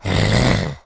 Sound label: miss, zombie; justzombies